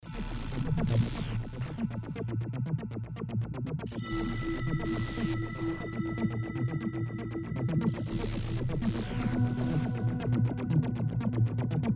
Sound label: Electric bells